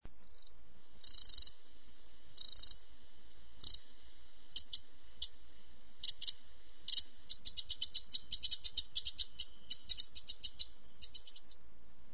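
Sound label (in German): zaunkönig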